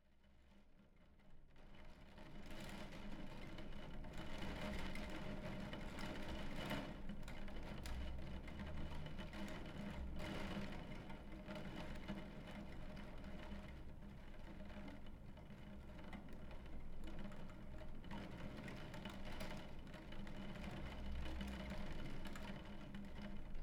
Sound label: Rain on a skylight, Cardiff